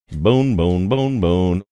singing boom boom boom boom

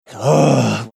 groaning

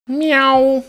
meow

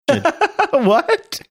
laughing saying what

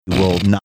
snorting

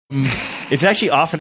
snickering

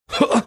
"huh uh"

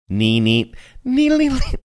singing few notes laughing

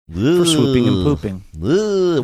making like sounds

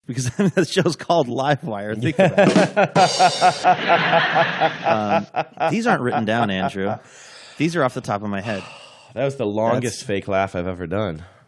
longest fake laugh

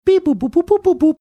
making data processing beeping booping sounds

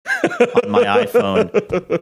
chuckling

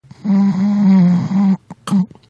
snoring noise

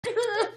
odd laughing sound

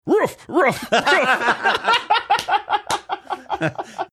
saying ruff ruff laughing

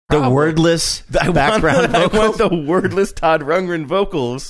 wordless background vocals

